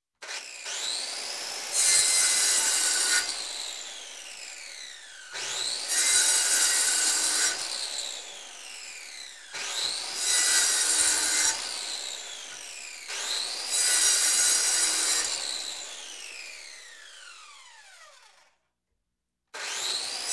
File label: Electric Cutter Cutting Metal